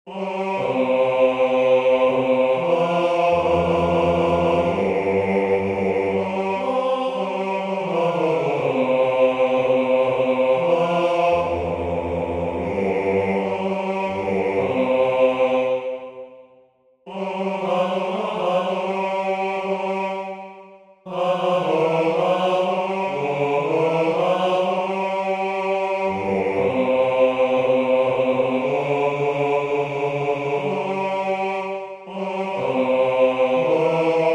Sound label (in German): Bass